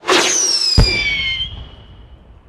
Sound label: Dragon Rocket Tot; Rabbids Multiverse